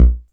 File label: Kick Delay, TR-606 Bent Samples; Sonic Foundry (Sony Creative Software); The Electronic Music Manuscript Collection; Battery 3 Sample Kits